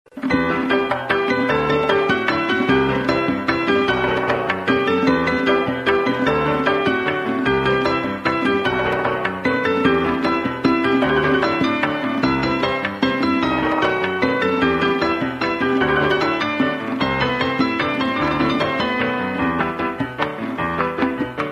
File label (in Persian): Raghs Baa Piano Va Tonbak